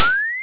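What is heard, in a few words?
warp sound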